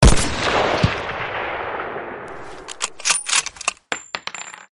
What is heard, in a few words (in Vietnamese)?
Tieng Sung Kar98 Giam Thanh